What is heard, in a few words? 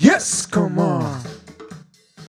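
vocals, Roots Disco; USB Soundscan vol.32; AKAI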